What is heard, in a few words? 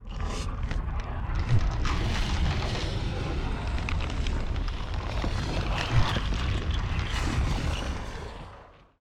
seed queen idle; monster